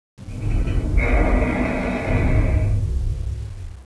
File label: groan, ambience; caged